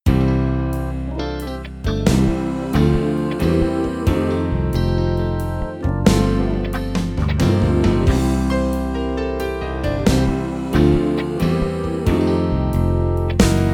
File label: Gospel SD1000